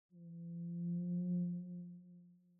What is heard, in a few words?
Whale Call Low (echo), Underwater